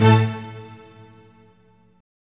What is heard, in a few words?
Strings